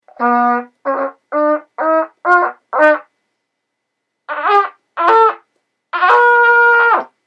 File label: trumpet c scale